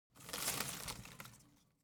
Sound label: foley vegetation home plant leaves rustle soft